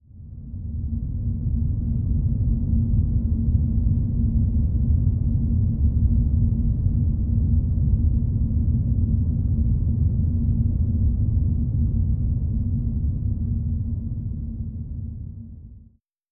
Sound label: space Tone